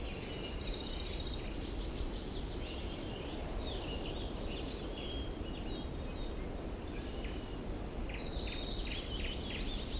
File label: forest bright; 中央